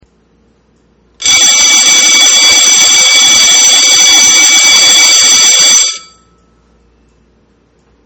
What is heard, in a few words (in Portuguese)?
timpano